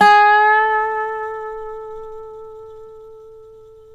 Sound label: SLIDE, Dobro Slides